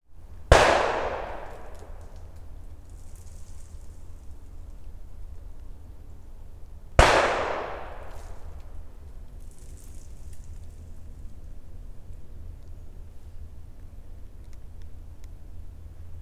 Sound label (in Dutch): Gun Shots Met Overvliegend Lood